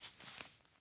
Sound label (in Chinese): 猫退出躲藏